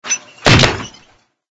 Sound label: cog jump to side; Poodletooth-iLand